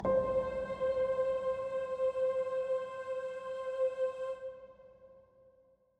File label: ENERGY Harmony Note C4; Cave Music